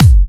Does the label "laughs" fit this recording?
No